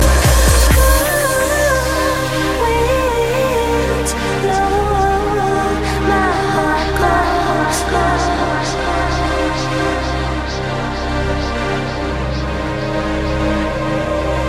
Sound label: Cold Winds